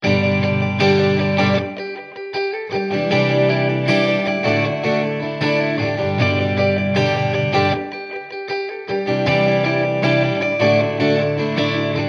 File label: Simple Days Of Joy, No Drums